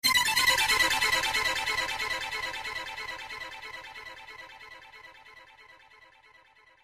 ring tone

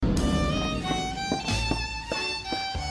Melodi Ruski

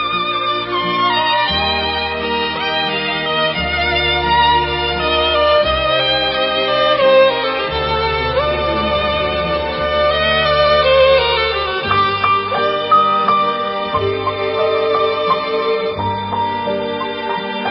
Violin Waltz

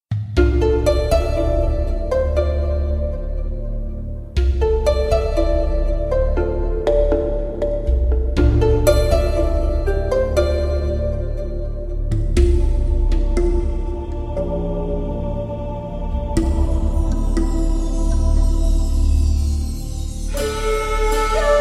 bamboo sound; ringtones

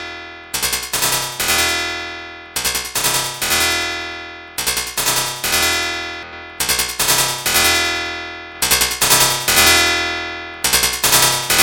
Alarm Sound Nokia

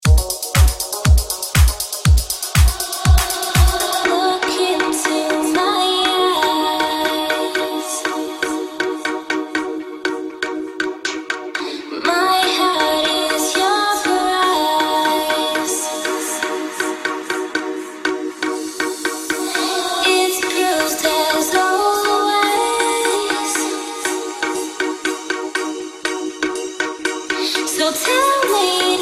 Deep House Vocal

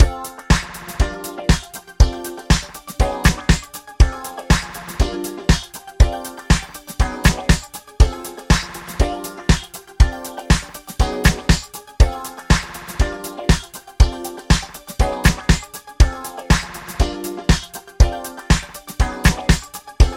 Disco Ring

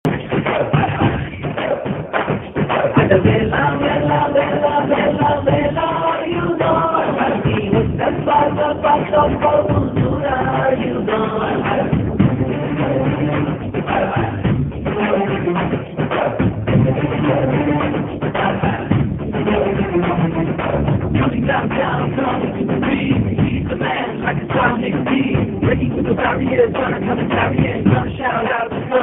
cut song

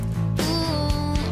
Suspense

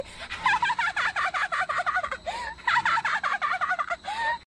house, dance

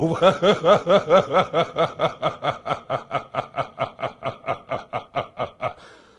ahaha

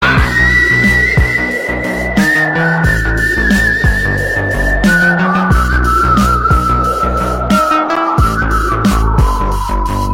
Whistle New; Kabali

